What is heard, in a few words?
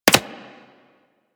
Bullet Impact Generic; Surface